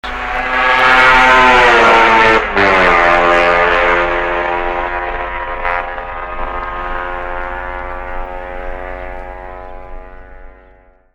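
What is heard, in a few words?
Greeves 250, motorcycle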